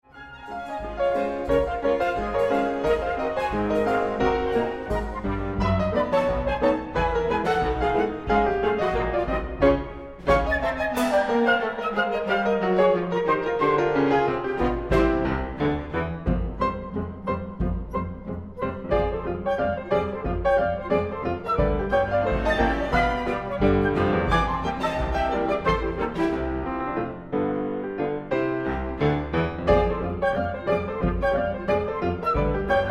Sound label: Ragtime Orchestra